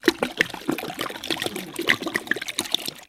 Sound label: bottle pour